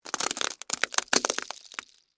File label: Crack Crunch Slow, Texture, Crack, Crunch, Slow, Penne, Rigate; Blast Wave